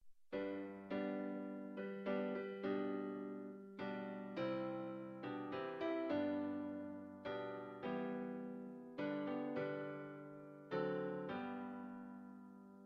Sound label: Piano; Amazing Grace